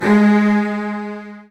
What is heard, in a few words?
Virtuoso Strings, edgy, G#2; ILIO; Kirk Hunter Virtuoso Strings VOL-2; 90 s Sample CDs